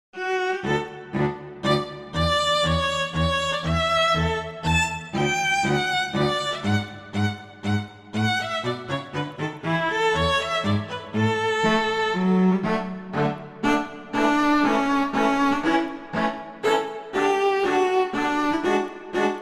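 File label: Minuetto, Allegro, violin cello; Mazas; Duets; Duet 10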